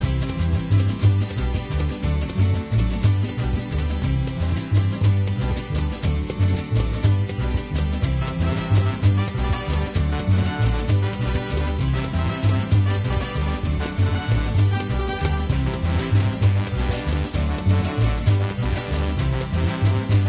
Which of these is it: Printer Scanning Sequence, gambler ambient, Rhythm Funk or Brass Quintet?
gambler ambient